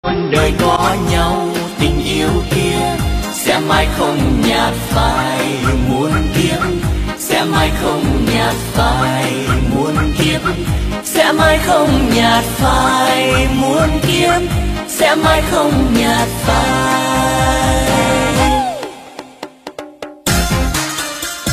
LK Nhạc Bolero Trữ Tình Nhạc Vàng Bolero Sôi Động; Nhạc Chuông

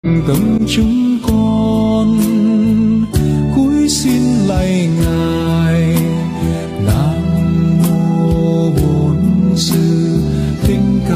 Nhạc Phật Giáo

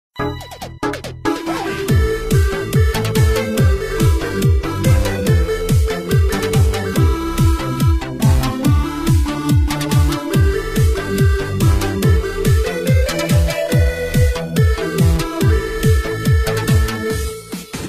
Nhạc Sống Dj Remix EDM Lk Nhạc Sống Trẻ

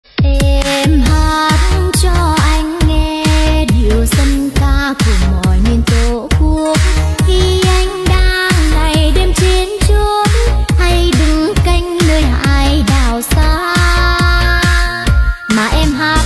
Nhạc Sống Thôn Quê Trữ Tình Remix; Căng Đét Sập Làng

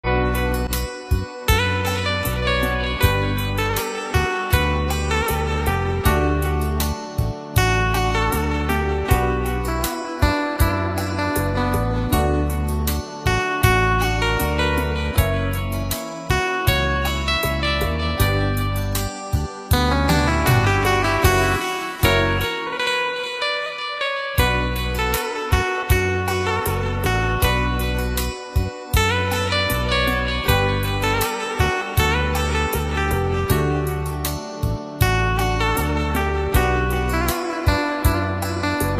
Hòa Tấu Guitar Không Lời Rumba Phòng Trà Nhạc Buổi Sáng Nhẹ Nhàng

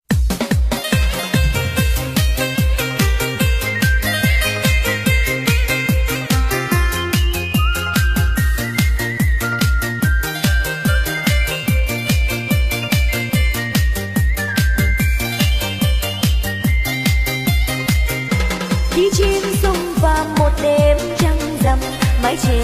Liên Khúc Nhạc Sống Hà Tây Remix Giọng Ca Mới Cực Sôi Động

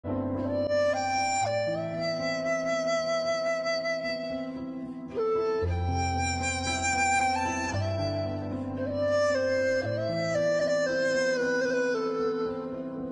Nhạc Không Lời Nhẹ Nhàng Sâu Lắng; Thả Lòng Mình Theo Tiếng Nhạc

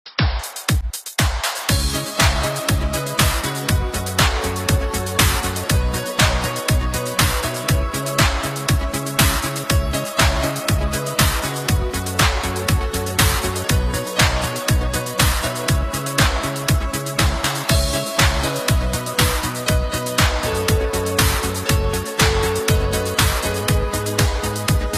Liên Khúc Nhạc Sống Organ Không Lời; Nhạc Chuông

